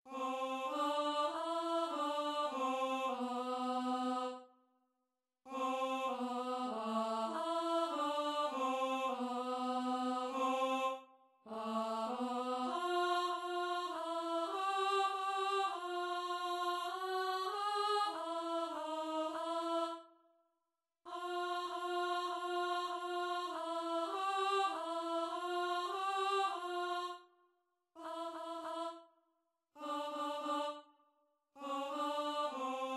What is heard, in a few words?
adoramus te contralto